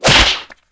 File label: slap hit; tf